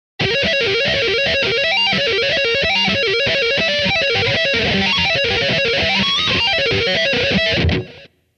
Diminshed Arpeggios With Taps; Mick Thomson

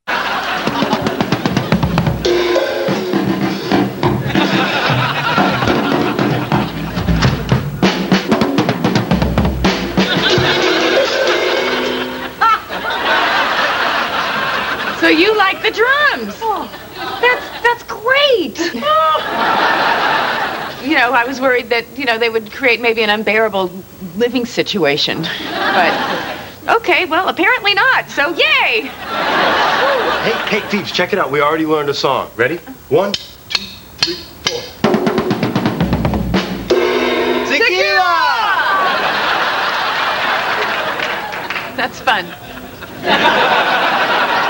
Drums; Rachel and Joey learned a song on the drums; TOW Chandler and Monica's Wedding

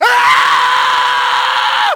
screamm

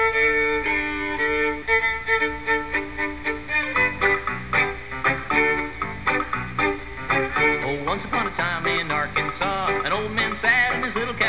violin

